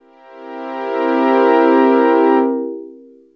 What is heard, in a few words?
Strings, dx7